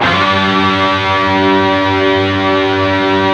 PRS G#3; Guitars

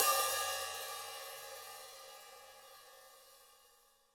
Open Hat up3; EMU PX-7

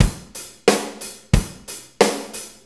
90 ROCK, DRUMS

